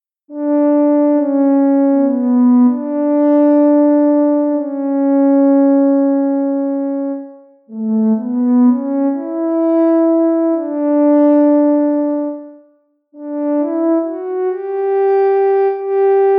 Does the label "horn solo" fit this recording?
Yes